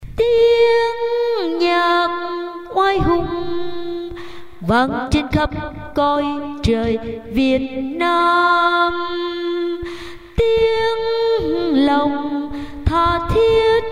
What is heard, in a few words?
basso